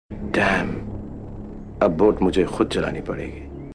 Msg Tone; Don 2

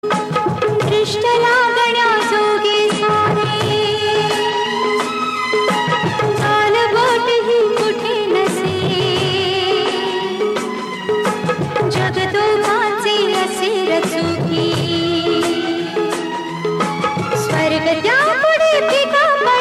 Marathi Song

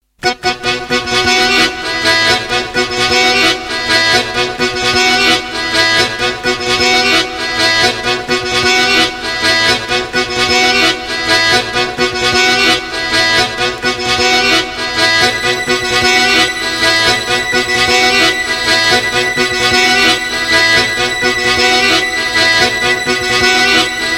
Accordion

